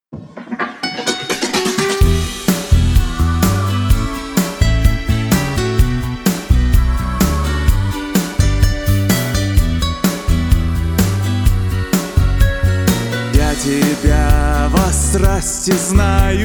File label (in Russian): Любовь аранжировка; KolomBit studio аранжировка DEMO